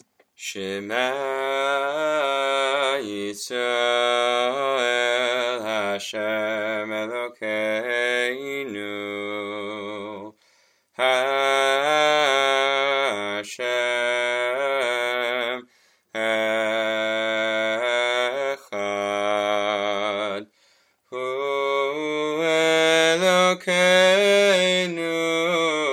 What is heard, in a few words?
Shema Yisrael Slow Melody; Musaf; Bsheim Hashem